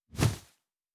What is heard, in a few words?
Wing Flap, Monsters; Fantasy Sounds Bundle